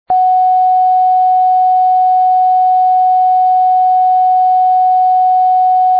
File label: F sharp 2, recorders; tuning